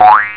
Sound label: boing